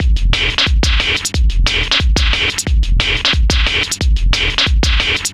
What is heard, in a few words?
090bpm, Downbeat Dance Grooves